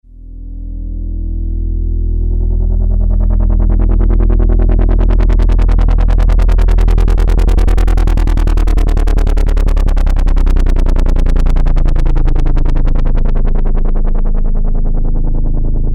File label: Helicopter